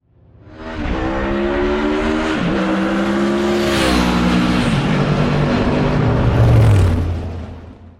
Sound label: race, Vmax; 新型VMAX; 排気音; ドラッグレース